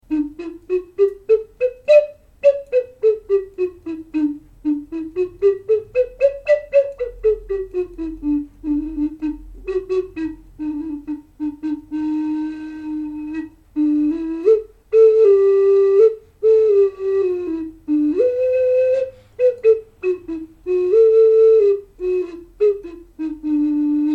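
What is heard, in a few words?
ocarina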